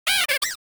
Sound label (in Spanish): Grito de Glameow; Gritos de Pokémon de la cuarta generación